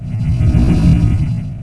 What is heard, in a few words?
metabolize; weapons